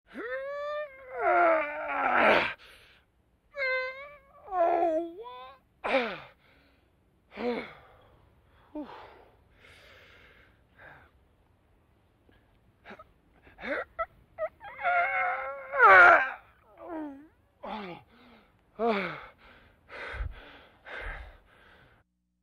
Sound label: straining